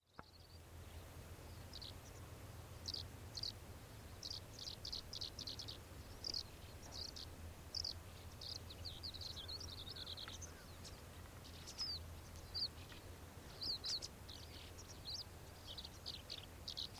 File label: pong larks